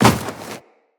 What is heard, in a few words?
Run Snow, Footsteps; Characters; Tenno